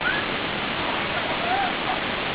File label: child laughter